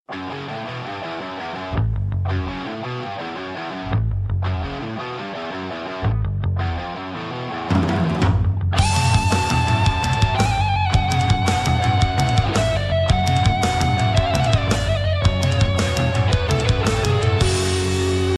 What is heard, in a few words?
persian rock